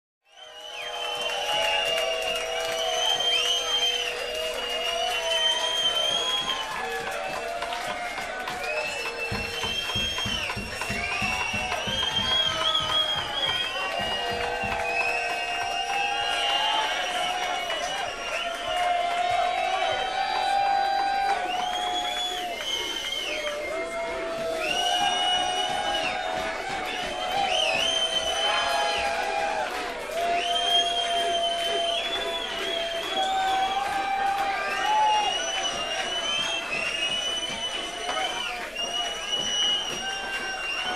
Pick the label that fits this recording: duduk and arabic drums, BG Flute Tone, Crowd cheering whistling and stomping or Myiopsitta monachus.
Crowd cheering whistling and stomping